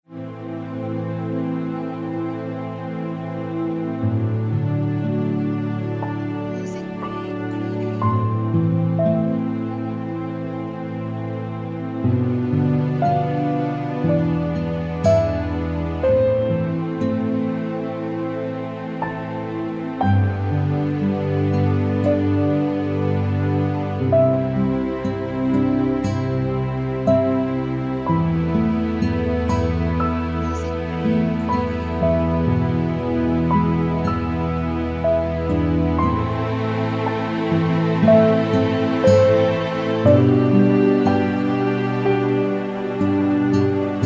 Calming Moment, piano

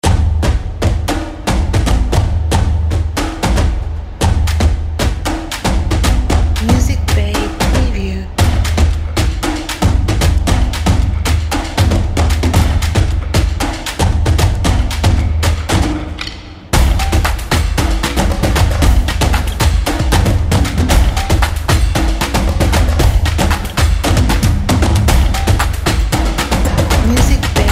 Percussion Action Epic Drums

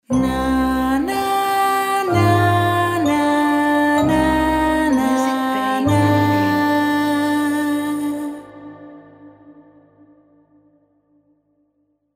Horror Vocal Ident

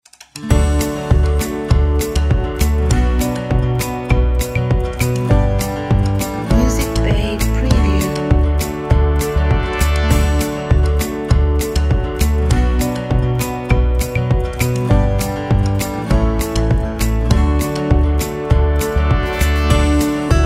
upbeat acoustic

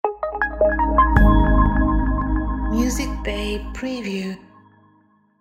Inspiring Logo

